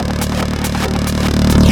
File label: Loops Fills; Vengeance Samples